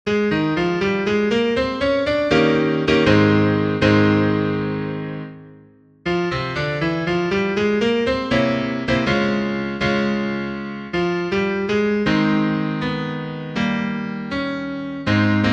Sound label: piano Ab